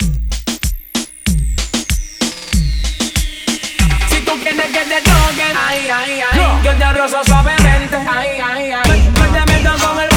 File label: Acapella